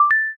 quick press

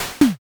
garbage

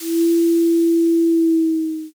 shakuhachi

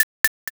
rap noise

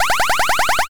fast forward

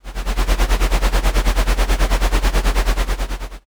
helicopter

